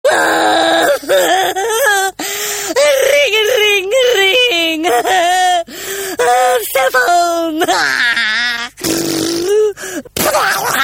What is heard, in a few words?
Funny Farm Cellphone